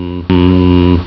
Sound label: STAIRS